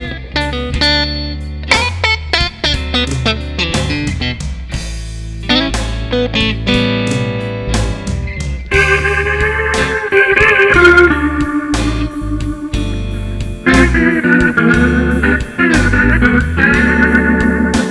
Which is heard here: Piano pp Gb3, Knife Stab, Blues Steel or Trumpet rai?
Blues Steel